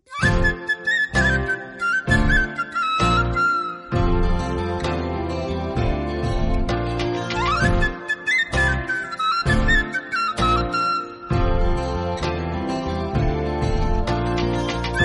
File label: Comedy Bgm Ringtone; Remo; Tamil